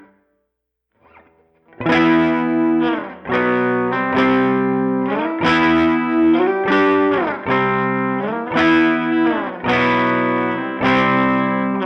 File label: Clean, VOX AC30 + Centaur